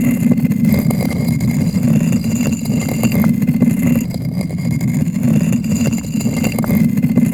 Grinding Wheel Loop; moul-assets

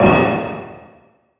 Random Metal Drops; moul

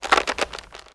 Rope Ladder

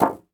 Styrofoam Hit

